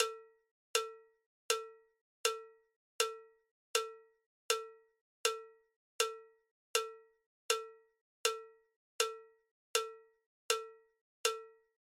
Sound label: Cowbell 80bpm